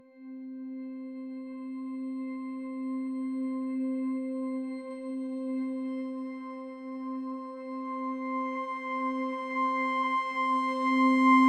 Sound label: tense but intriguing